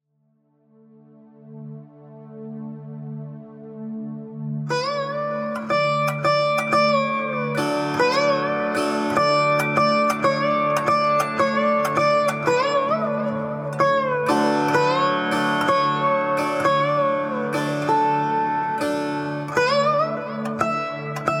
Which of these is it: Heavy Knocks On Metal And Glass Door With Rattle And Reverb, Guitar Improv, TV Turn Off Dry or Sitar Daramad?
Sitar Daramad